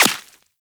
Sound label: Bullet Impact Flesh; Warframe